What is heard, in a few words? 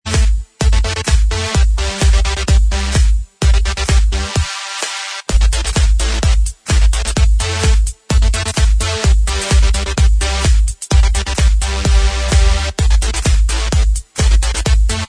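Inst Track, instrumental track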